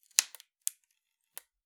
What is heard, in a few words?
Palette, WoodenPalette